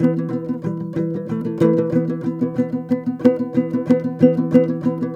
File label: TOPSHUR; Soundscan vol.60; Asian Traditions; AKAI